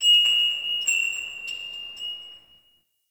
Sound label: Town Door Bells, Ambience; Warframe; Duviri